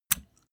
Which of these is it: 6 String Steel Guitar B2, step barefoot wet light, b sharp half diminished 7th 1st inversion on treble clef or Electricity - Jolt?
step barefoot wet light